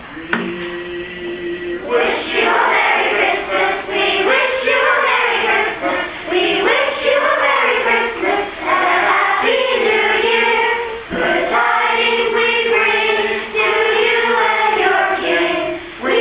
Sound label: Grade School Choir We Wish You A Merry Christmas; Etna